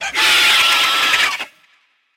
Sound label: cockatrice attack